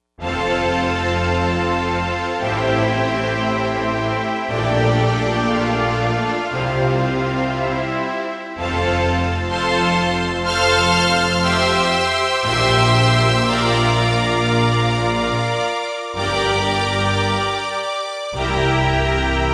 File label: Lush Brite; Digital Synthesizer